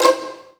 COL LEG A 4, STRINGED; East West; Global Sounds

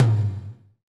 TOM TOM, Roland

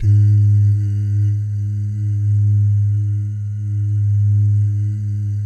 BS DOO, Vocal Planet Jazz & FX; ILIO; JAZZCOMBO

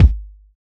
Kick, Kickdrum; Equipped Music; Loungin House

